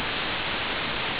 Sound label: static; cs poltergeist